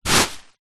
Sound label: household beanbag small sit on